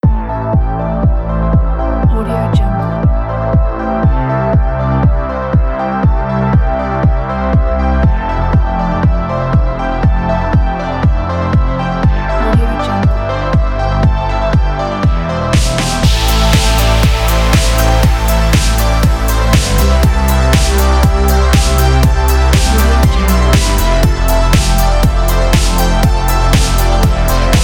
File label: 80s dark synthwave